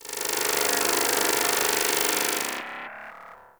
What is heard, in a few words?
Resonant Energy; Ambience